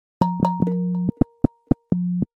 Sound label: ting ting sms tone